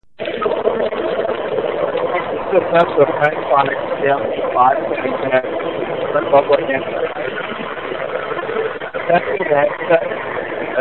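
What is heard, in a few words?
Indust 95db, headset; Plantronics PLT510; Mogul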